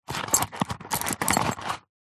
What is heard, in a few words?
foley shoe high heel handling; zapsplat